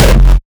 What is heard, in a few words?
kick oneshot monster F; GhostHack